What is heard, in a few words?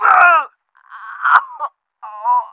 death; action-quake2